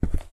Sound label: footsteps carpet Walk; SFX Characters Player PlayerWalk